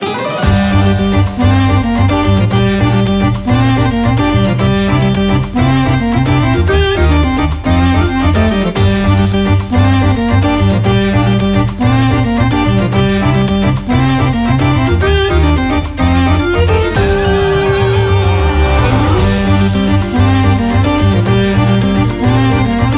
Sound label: Desert loop